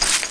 player leaves

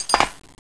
player metal

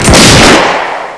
fire, pistol; weapons

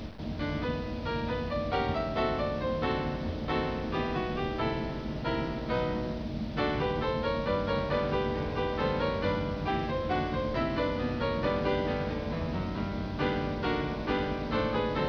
piano

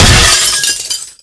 bust glass

